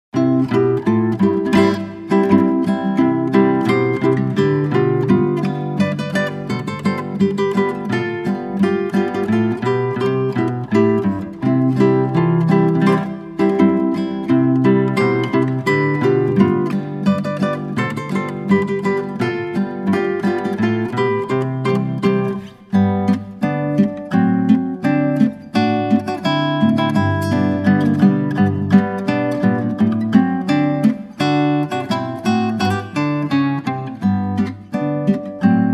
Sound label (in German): Boarischer